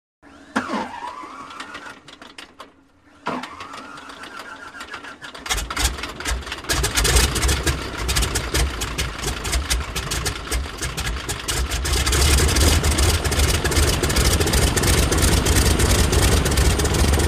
Spins And Slows To Stop. Motor Starts On Second Attempt With Sporadic, Irregular Popping, Then Revs Up With Prop Buzz. Close Perspective. Prop Plane.; Broussard